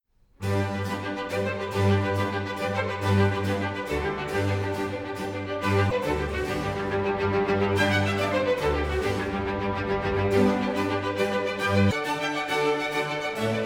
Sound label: Concerto for Strings in G Major